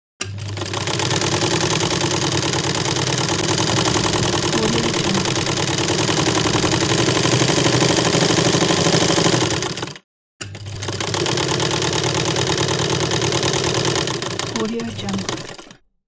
sewing machine with various runs

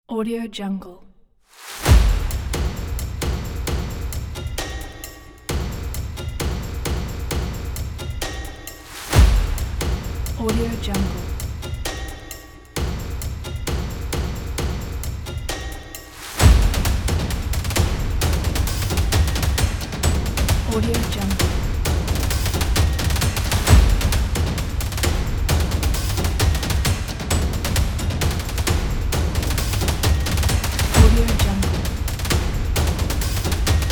the epic drums

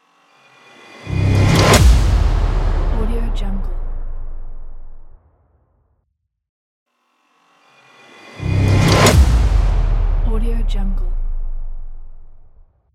crash explosion; phillipmariani

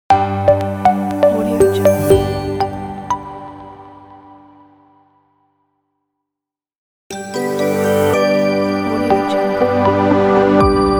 corporate logo pack

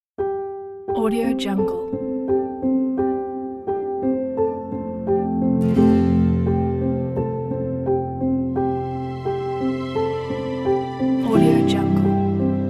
logo evolving

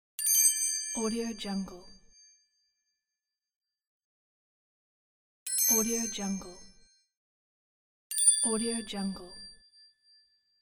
twinkle star

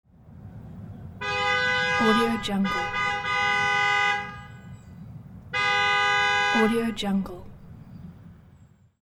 car horn in residential neighborhood

